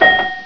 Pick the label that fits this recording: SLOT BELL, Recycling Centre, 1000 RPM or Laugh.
SLOT BELL